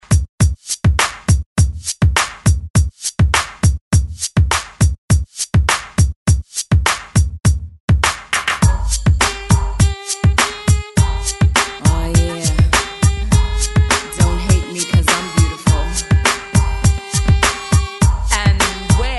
90s Re Drum 102Bpm

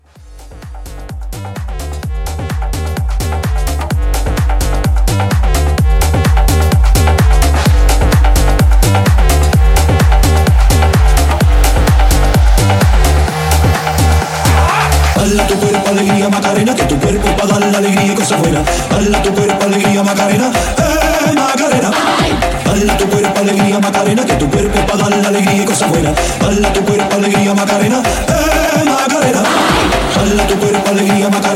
House Mashup 128 CLEAN

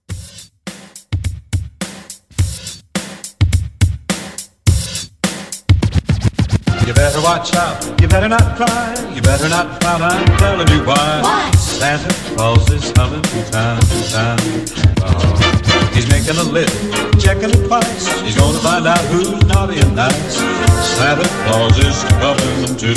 Christmas Re Drum

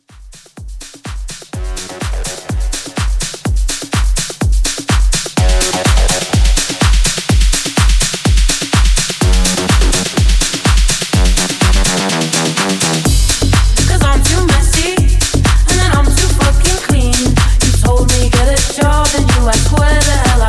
House Mashup 125

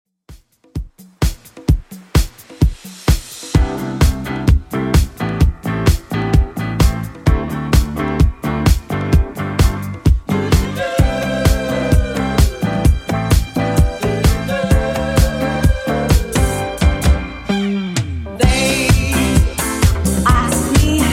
70s Redrum 129bpm

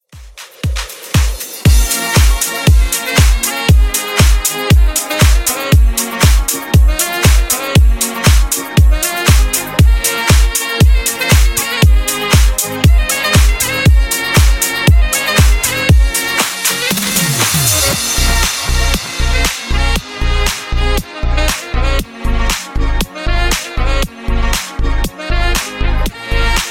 Nudisco Remix 118 Bpm

